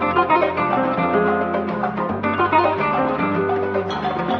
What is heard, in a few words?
guitar flamenco, Phonky Loops